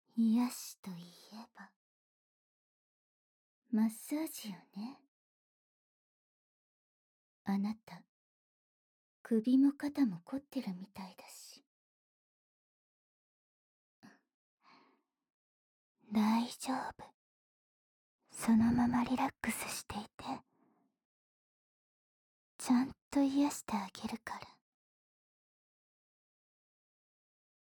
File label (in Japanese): 首や肩のマッサージ 反転, ASMR